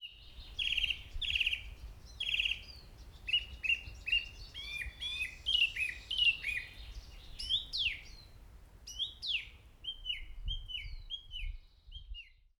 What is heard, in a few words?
noisy